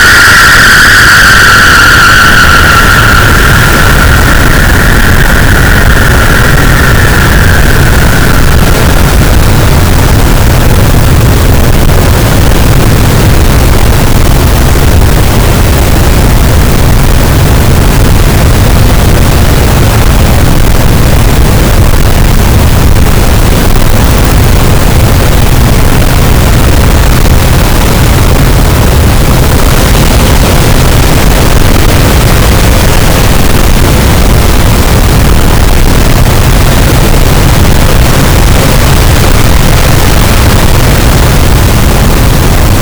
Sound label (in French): cachalot, gaussian noise; Physeter Macrocephalus